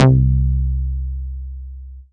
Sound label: Yamaha TX81Z Lately Bass C4